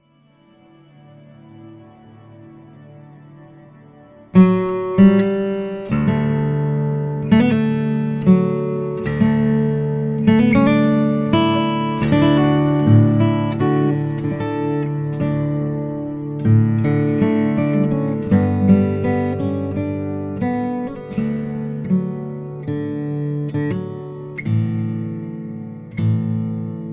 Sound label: new age celtic solo guitar